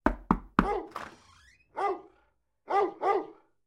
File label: Door Dog Sound